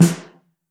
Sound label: Mono, Snare